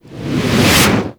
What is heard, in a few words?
Environment Explosion; Gameplay; Warframe; The New War; Mission Four